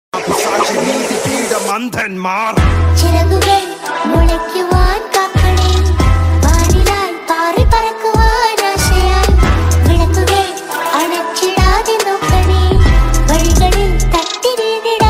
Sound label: Remix Ringtone